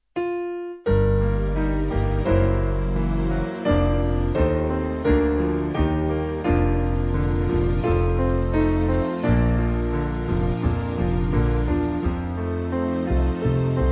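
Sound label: Band, Music Backing; Peace Perfect Peace; Pax Tecum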